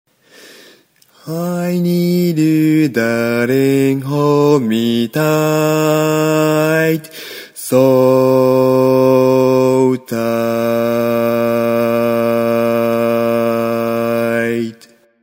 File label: bass